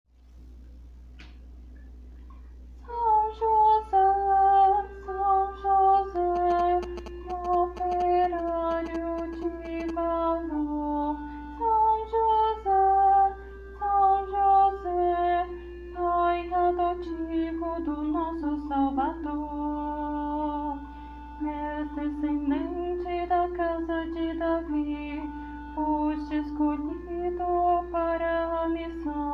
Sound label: soprano; hino a sao jose